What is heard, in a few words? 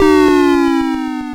loss; Игровые Автоматы; crazymonkey